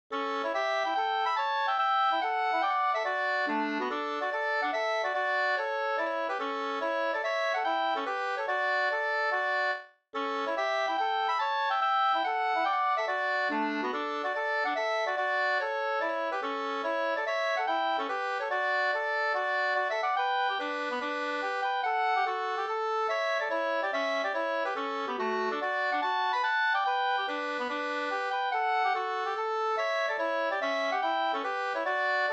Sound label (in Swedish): schottis